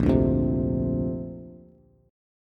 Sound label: Bb Major Add 9th, Bass; strummed; JGuitar